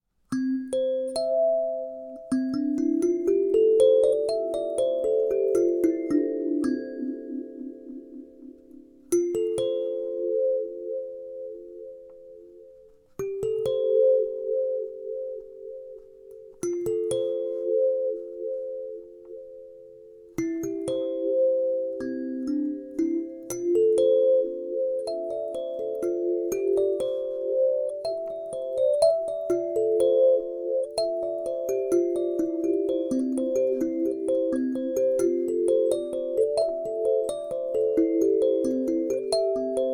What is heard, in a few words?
C Major, kalimba